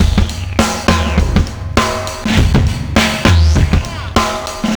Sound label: Rejack 101 bpm, breaks; Zero-G Beneath the Planet of the Breaks